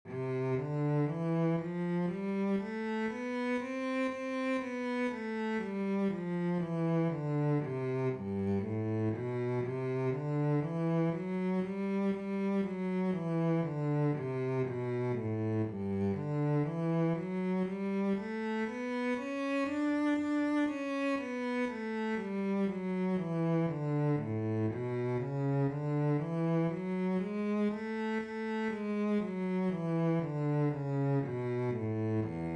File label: Twelve One Octave Scales Bass